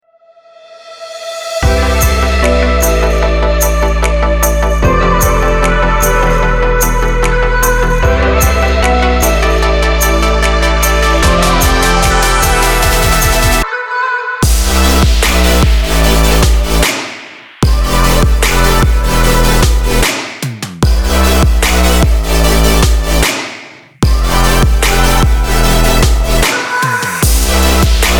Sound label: electro bass